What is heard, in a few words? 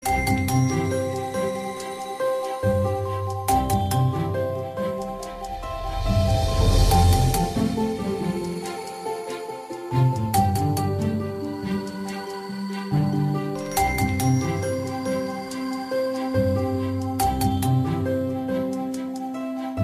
good morning alarm